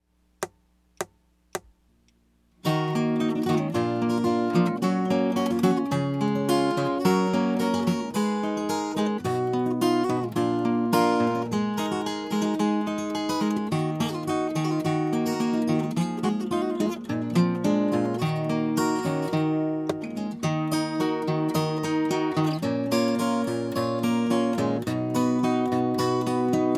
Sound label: Slow Mono